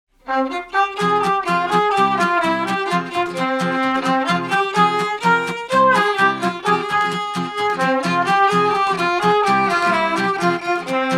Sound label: yiddish klezmer trio